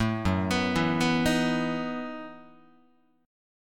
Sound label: F sharp Minor 13th, Guitar; arpeggiated; JGuitar